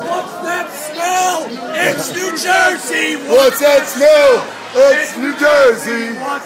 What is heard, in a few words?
whats smell, fan chants